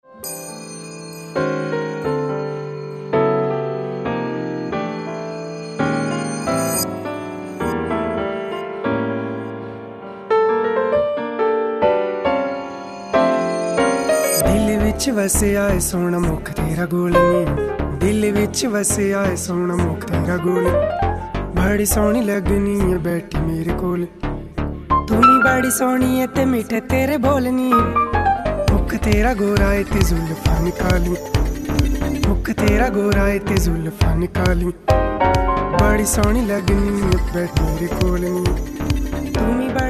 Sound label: bollywood swing jazz rework